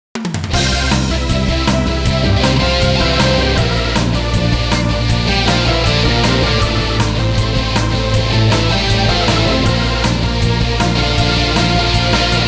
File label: Pops Beat; King of Fighters 2003